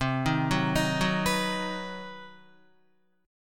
C Minor Major 7th Flat 5th chord, Guitar; arpeggiated; JGuitar

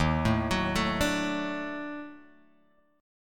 Eb Minor Major 7th Flat 5th Eb down Guitar Open G

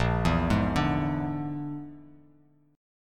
Bb 7th Flat 5th, Bass Standard chord; Bb7b5; arpeggiated; JGuitar

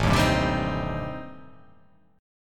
A-Minor 7th Flat 5th-A-down, chord; Am7b5 strummed; JGuitar

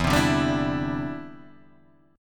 E Minor 7th Sharp 5th, guitar; strummed

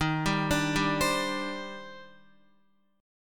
D sharp Major 13th, down, Guitar; arpeggiated; JGuitar

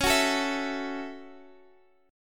A 6th C sharp, Chord

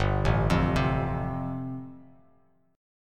G 7th Suspended 2nd Suspended 4th, Bass Standard; G7sus2sus4 arpeggiated

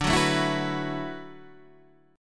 D 6th Add 9th D, Guitar, Half Step Down

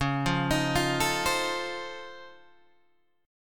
Db Augmented Major 9th, down, Guitar, Open G

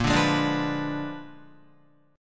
A sharp 7th Flat 9th, Guitar, A#7b9 chord; strummed; JGuitar

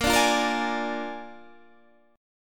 A sharp 7th A sharp, Guitar

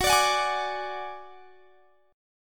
Gb Suspended 2nd Sharp 5th, Chord; strummed

